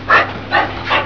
Hund

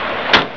TUER

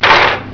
SCHLUES

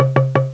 HOLZKLOP

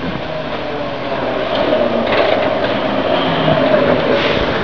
UBAHN

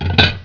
Teller

